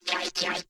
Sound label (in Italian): suoneria botton; beep